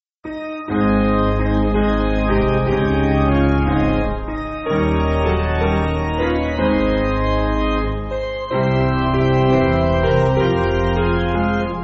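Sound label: Piano, Backing Files for Churches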